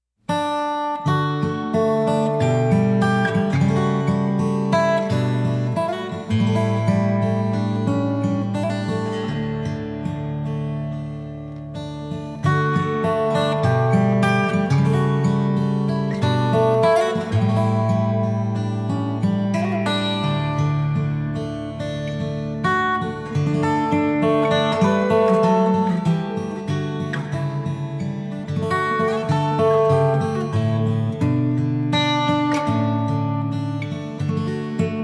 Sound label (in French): o jesus je t aime instrumental